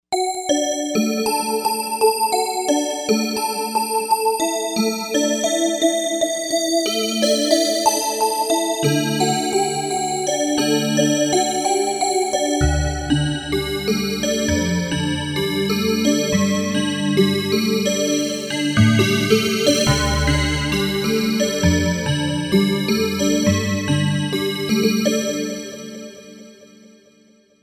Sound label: Trance Chime, Novation UltraNova; Factory Patches